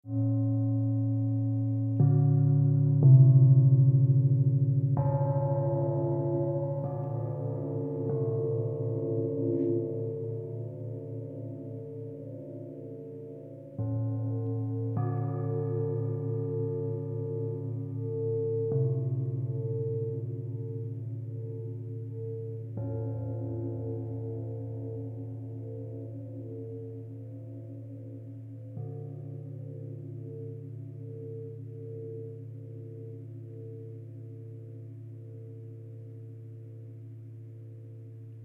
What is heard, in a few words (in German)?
Bassplattenglockenspiel aus Bronze